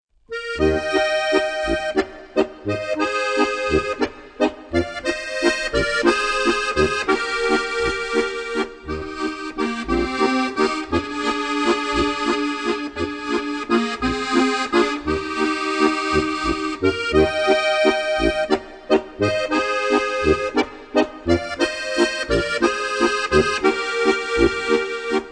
Walzer

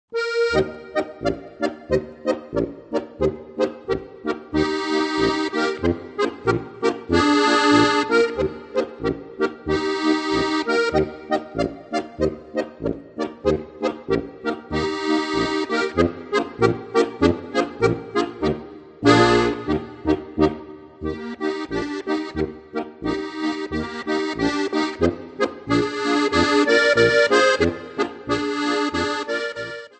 boarisch; Mein erstes Spielbuch

